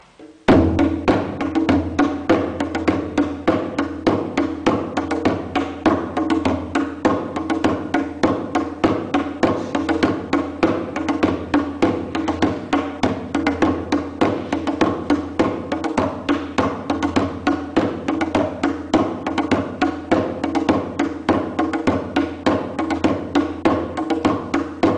Tribal Beat

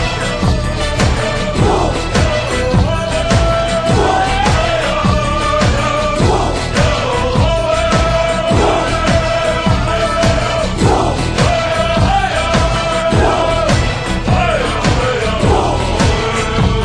Stomp Dance

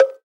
Bonk

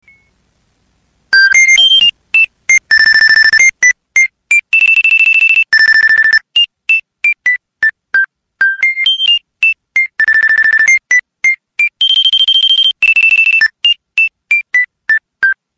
Cool Music Tone

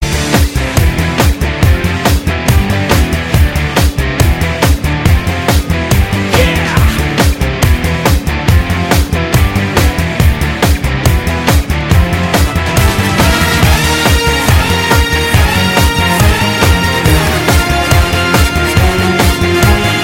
Gitar; Agent Vinod

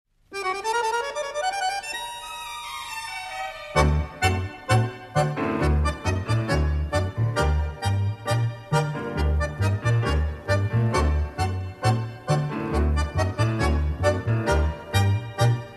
tango argintina

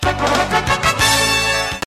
Victory; BoF3

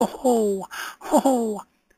Laughing; Pooh

